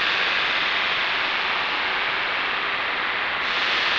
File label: sparse soundscape samples; ReLooped